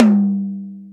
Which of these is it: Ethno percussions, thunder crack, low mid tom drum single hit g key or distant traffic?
low mid tom drum single hit g key